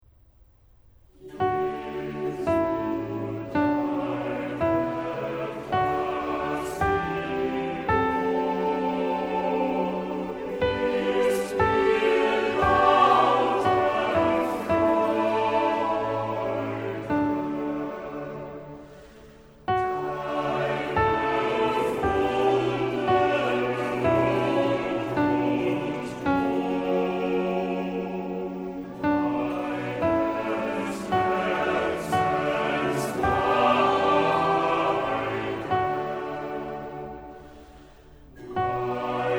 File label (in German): Sopran; Bach; Jesu deine Passion